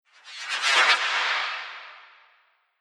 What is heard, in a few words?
Spectacular Sorceror Spell, magic sound effect; Spectacular Sorcerer Spell; Fantasy Sound Effect